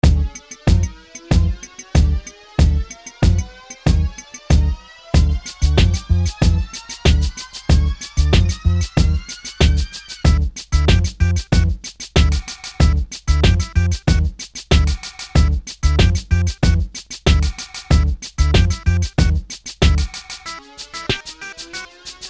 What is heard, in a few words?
disco